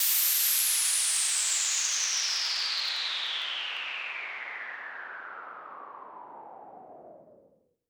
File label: Downlifter; 99Sounds x Monosounds